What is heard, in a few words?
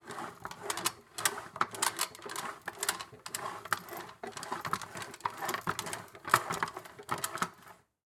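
robo foley joints movement; thelibrarybymtc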